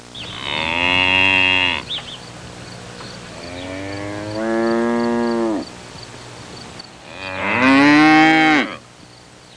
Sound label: Cow mooing birds chirping in the background